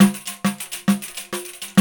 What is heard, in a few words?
PAN, ETHNIC PERC; Roland - LA Composer vol. 1